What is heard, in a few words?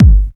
00s rap kickdrum sound g key